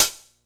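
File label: Hat Closed, Cymbals; Drums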